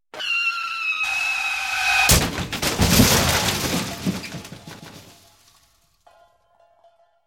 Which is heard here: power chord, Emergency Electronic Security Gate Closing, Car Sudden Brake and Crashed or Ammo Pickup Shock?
Car Sudden Brake and Crashed